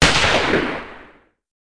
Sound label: GUN, crime